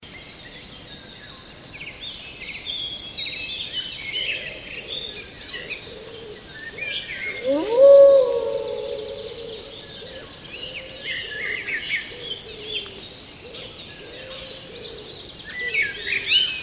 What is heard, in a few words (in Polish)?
Odgłosy zwierząt leśnych